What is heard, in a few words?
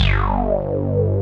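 ACIDIC BASS; Pro-Rec - Synthsonic; TRINITY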